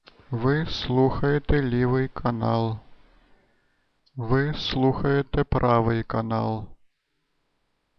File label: channel testing